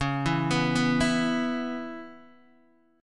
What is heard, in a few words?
Db Minor 7th Db Guitar Open D